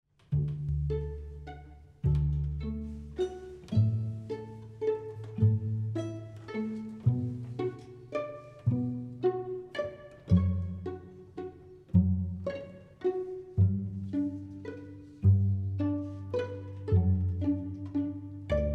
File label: Guitar Lullaby; Everything String